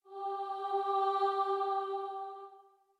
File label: starting note, voice